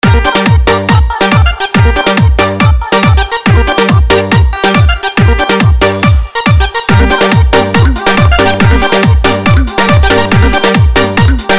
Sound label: Bhojpuri Remix Song